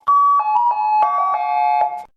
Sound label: signal